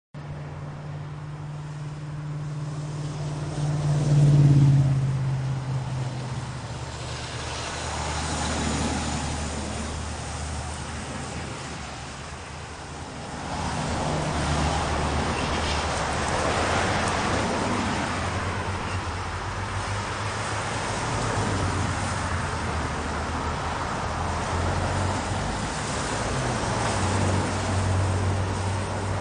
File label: street noise right to left; owyheesound